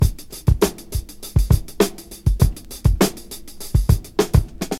101 bpm modern breakbeat sample e key, kick tuned to the E note